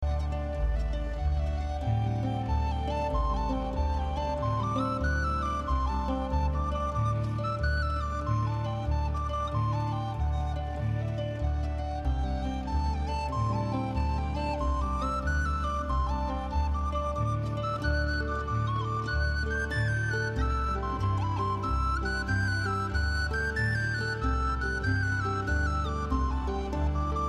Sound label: hi fi